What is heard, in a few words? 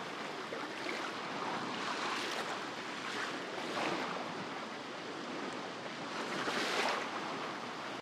BGM Wave BGM